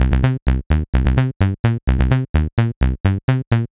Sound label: acid pluck